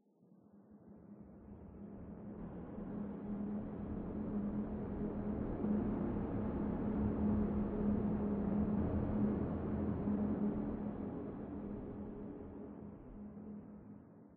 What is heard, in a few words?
Ruin Pad